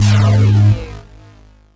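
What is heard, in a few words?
Production Element Distortion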